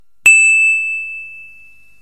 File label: Single Tone